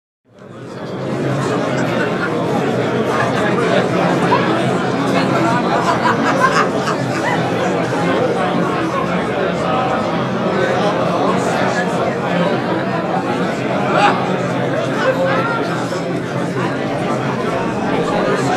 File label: Interior Mixed Party Buzz With Occasional Single Laughter.; murmurs various